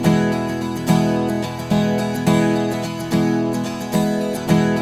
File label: Blues Guitar Samples; ILIO; Fretworks; 108 NATL RIFFS